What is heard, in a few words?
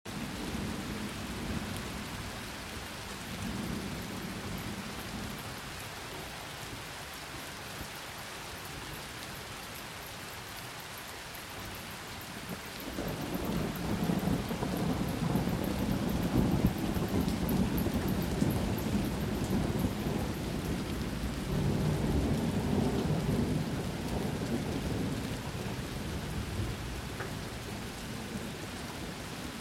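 pleasant rain sounds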